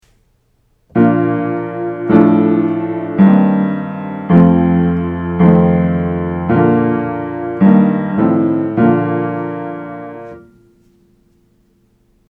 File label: Triads in Inversion; Harmonizing; Aural Training exercise